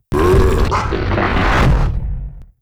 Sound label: CRAWLER BUNCH die